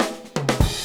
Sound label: FILL, Grooves Filz Hitz; PlatinumPhattCD1; E-MU Producer Series Vol. 8 – Platinum Phatt